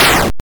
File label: Kill Enemy 8 Bit